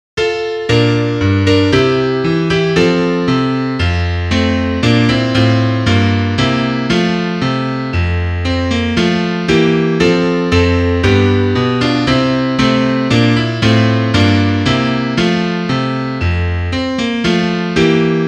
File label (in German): Klavier; nun will der Lenz